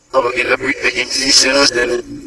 coro

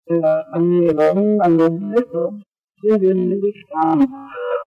telefoni

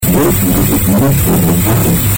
voci; voci là giù ottimiste gettavo

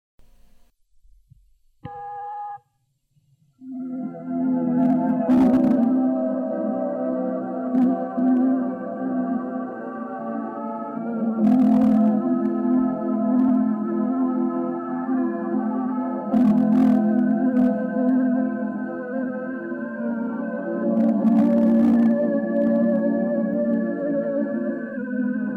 secondo coro voce

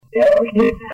piange

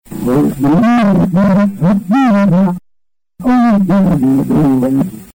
voci

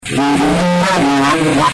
qui ride; qui ride, guarda

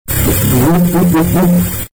strumenti

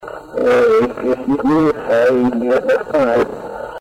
sniffa

